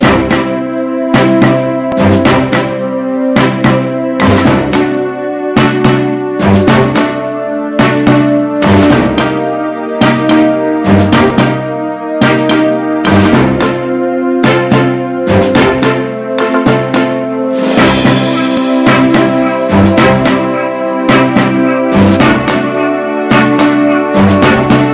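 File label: background music World Map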